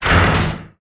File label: Close